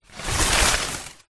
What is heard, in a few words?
foley paper sheets many crumpled move pull; zapsplat